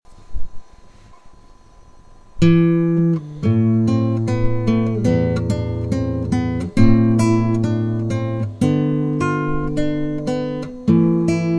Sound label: classical guitar